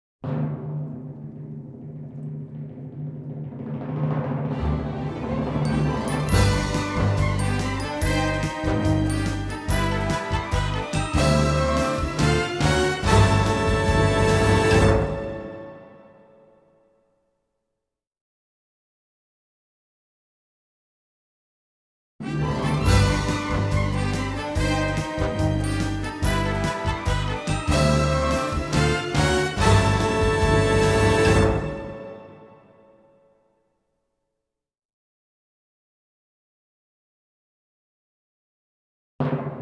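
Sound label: Fanfare - Instrumental; Network Production Music 110 You're The Star! (Multimedia)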